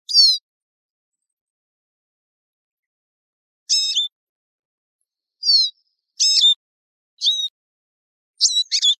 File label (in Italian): Lucherino